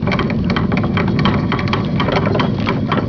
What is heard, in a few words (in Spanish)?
movimiento cadenas, ambientales